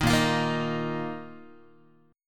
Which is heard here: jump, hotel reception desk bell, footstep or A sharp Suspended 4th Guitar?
A sharp Suspended 4th Guitar